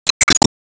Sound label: Enemy Sandwall Hand Delete